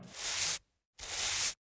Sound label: sweep